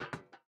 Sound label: Step Iron; MiSide